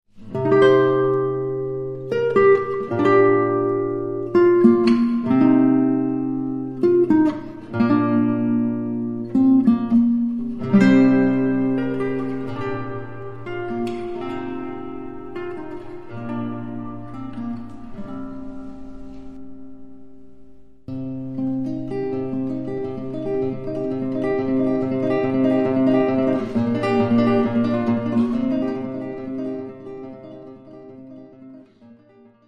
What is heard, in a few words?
Old Guitar